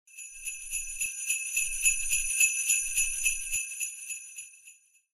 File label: Sleighbells